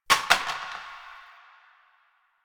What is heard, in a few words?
Plastic Drop; Warframe; NineteenNinetyNine